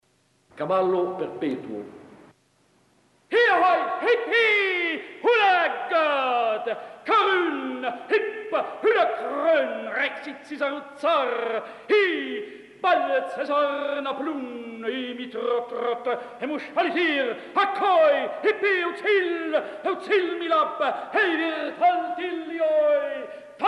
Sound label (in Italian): cavallo